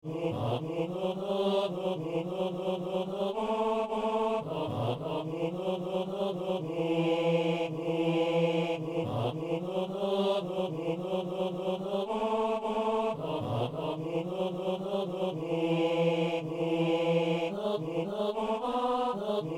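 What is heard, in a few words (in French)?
ténor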